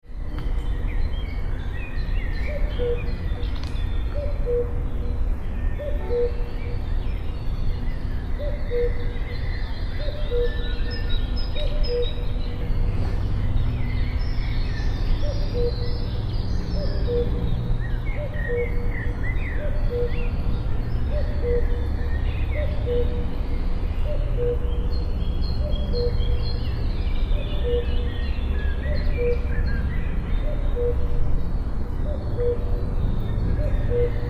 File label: cuckoo, Karlsruhe, Germany